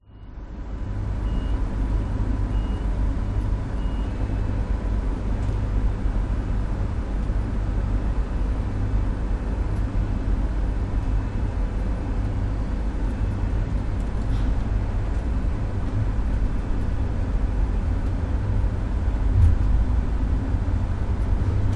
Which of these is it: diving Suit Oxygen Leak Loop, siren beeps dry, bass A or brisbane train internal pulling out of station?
brisbane train internal pulling out of station